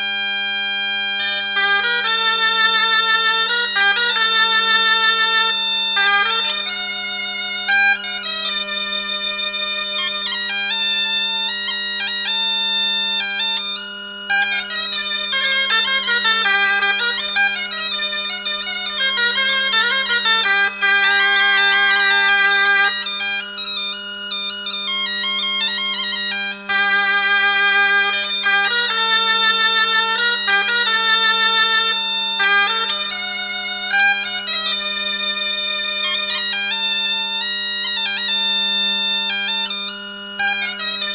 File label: Plinn Bal; Bro Dreger